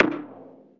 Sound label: Perc, Dubstep One-Shot Drums